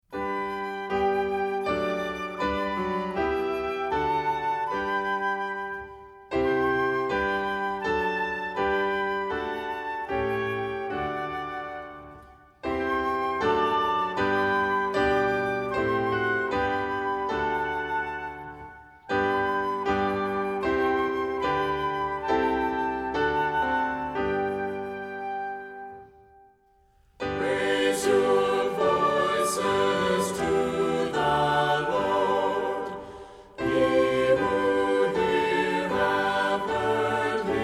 raise your voices to the lord vocal and instrumental, hymns